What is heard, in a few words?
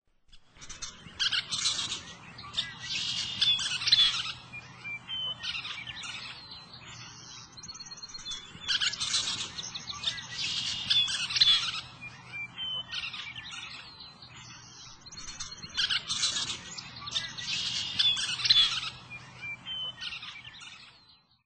Western Kingbird; Birds